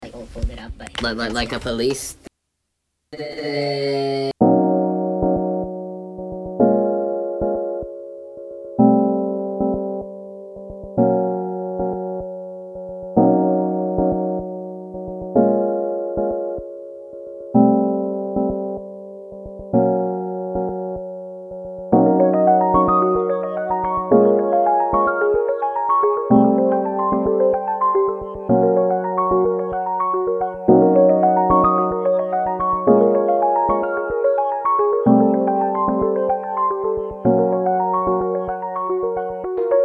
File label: Arp (109.613 BPM)